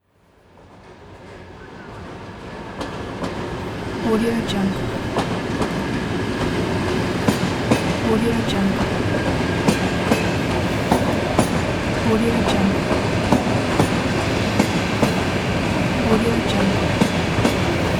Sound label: train moving slowly